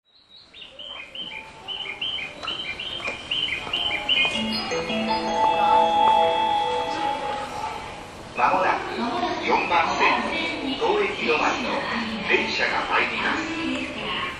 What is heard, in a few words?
approach, rail sounds; Stereo; Saikyo; Kawagoe